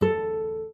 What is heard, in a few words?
Classic Guitar, notifications